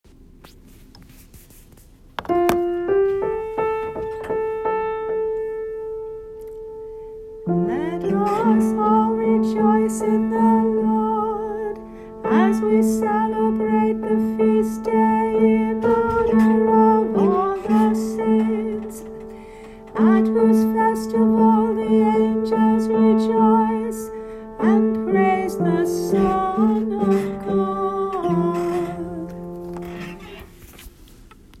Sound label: Antiphon; All Saints